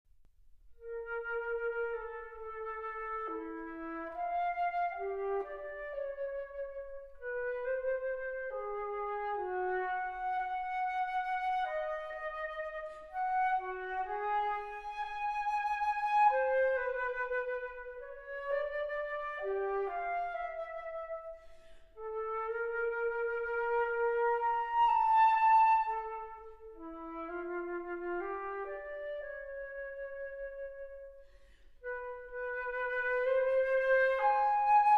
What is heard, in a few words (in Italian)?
Flauto